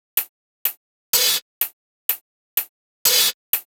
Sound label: Hi Hat; Afterhours EDM; Electro Shock; Sonic Foundry (Sony Creative Software)